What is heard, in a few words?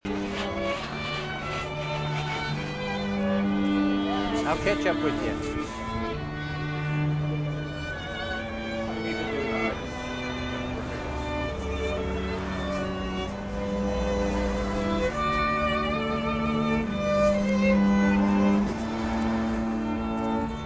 trio on castro street